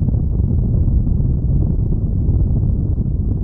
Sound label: Thruster Loop